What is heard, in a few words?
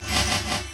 REVERSE LOOP; Rarefaction - Poke in The Ear With A Sharp Stick